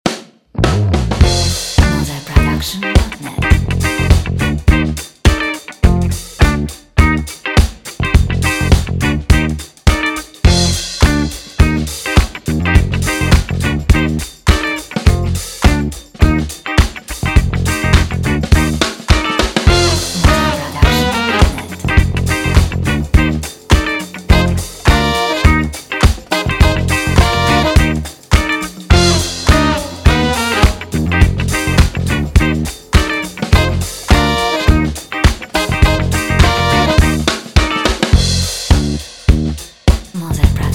Positive Upbeat Funk

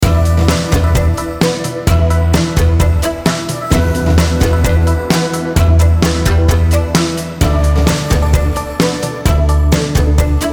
KungFu Loop

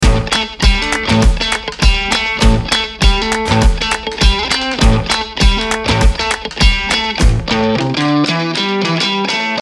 Indie Garage Loop

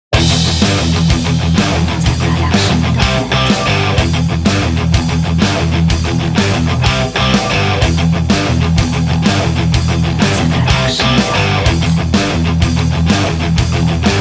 Groove metal

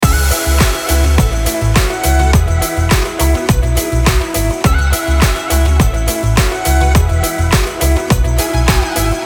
Pop Loop

